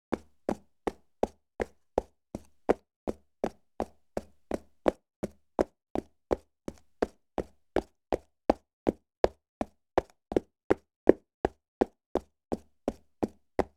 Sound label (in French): Trot Cheval sol dur